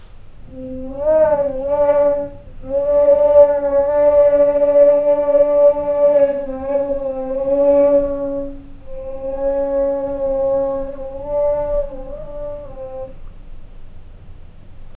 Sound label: a canary sings in a; bird